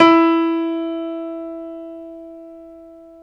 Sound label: PNO, Grand Pno, Roland; Club-50 - Foundations Roland